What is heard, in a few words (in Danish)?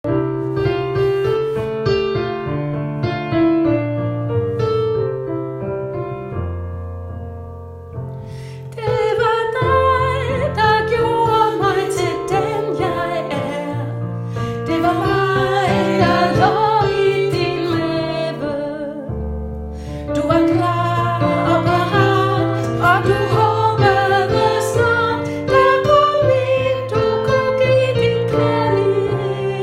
dine øjne sopran